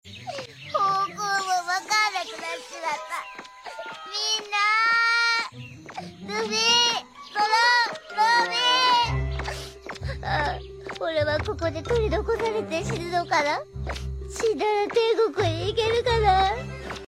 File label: ringtone